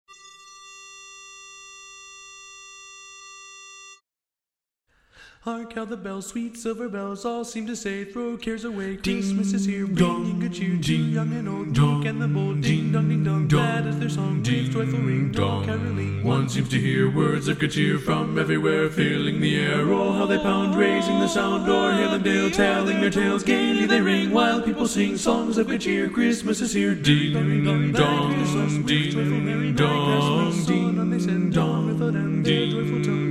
carol of the bells bass

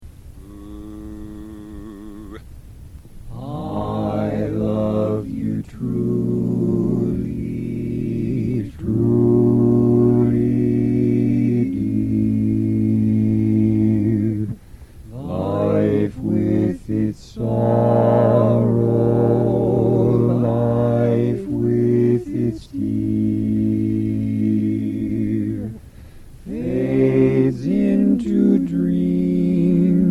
bass

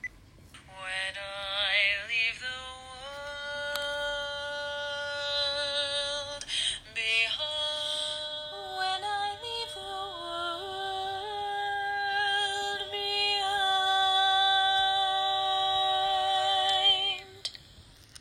tenor tag

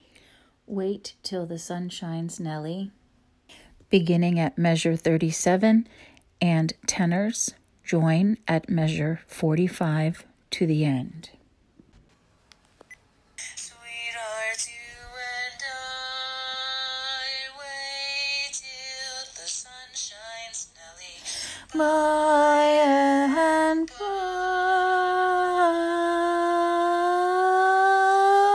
wait till tag interp tenor

